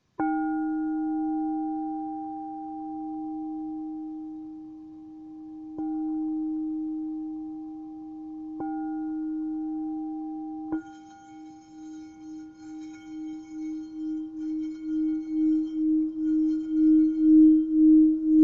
re, cuencos tibetanos

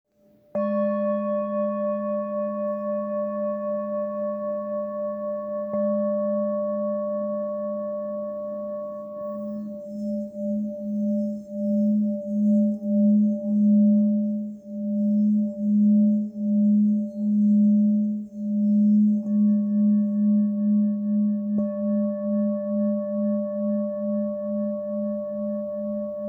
Luna, cuencos tibetanos

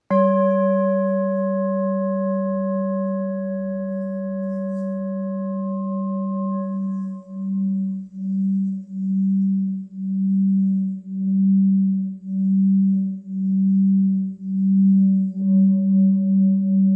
Sol 194 planetario, cuencos tibetanos

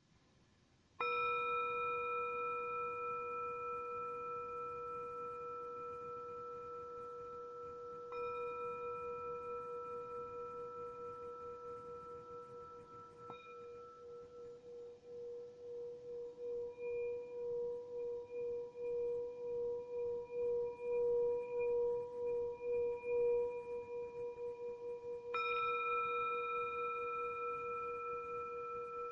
deluxe, cuencos tibetanos

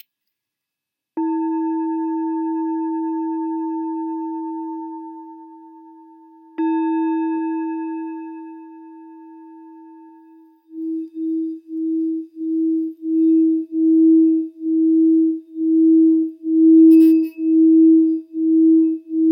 jambati, cuencos tibetanos

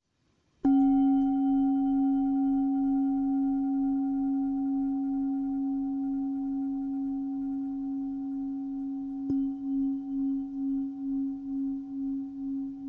cuencos tibetanos; 8 chakra

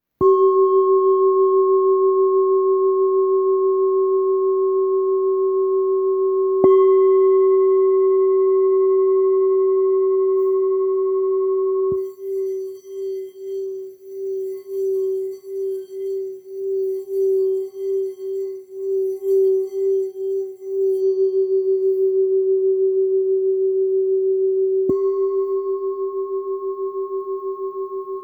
sol, cuencos tibetanos

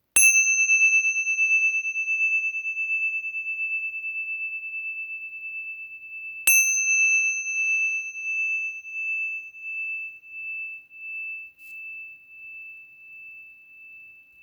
Mi 281g, cuencos tibetanos

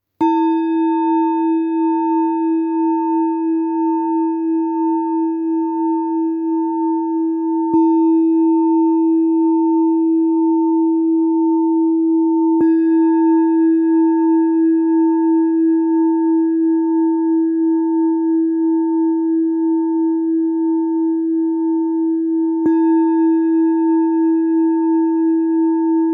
Nada re mi, cuencos tibetanos

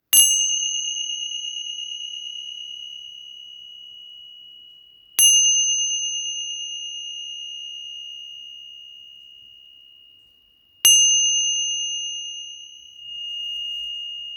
Lisos 65 fa, cuencos tibetanos

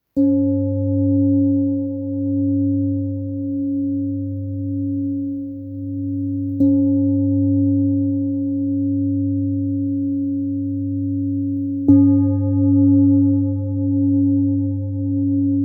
Sol, cuencos tibetanos

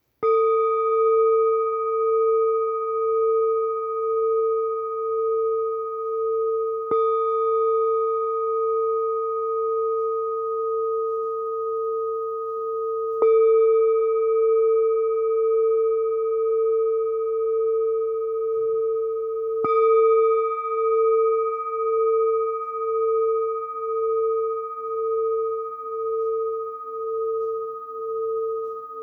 Deluxe la 446, cuencos tibetanos

